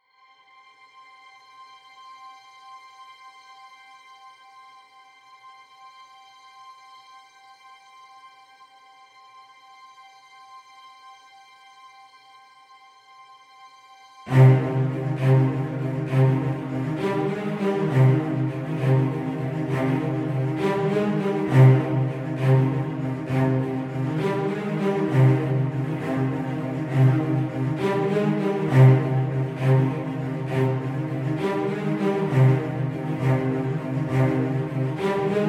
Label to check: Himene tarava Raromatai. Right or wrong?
Wrong